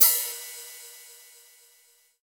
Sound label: ambient cymbal sound sample g sharp key; Cymbal drum one shot samples